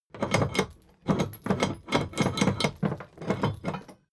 drawbridge winch hoist sluggish; soundpool

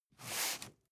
cardboard box medium slide short; objekte

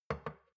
shot glass place table soft; berufe; gastronomie; soundpool

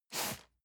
cardboard box medium slide short hard; objekte

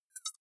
hover high tonal

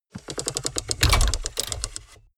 mechanics medium rattle; industrie wirtschaft; mechanik

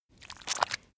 step mud slow normal; schritte

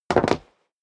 step sneaker wood parquet jump; schritte

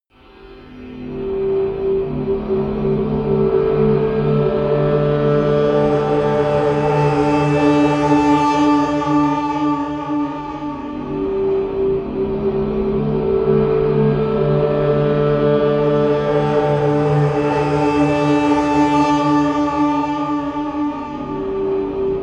whale song, music elements

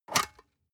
storage lid slide open hard; objekte

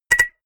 notification signal

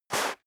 step dress boots sand slide short; schritte; menschen

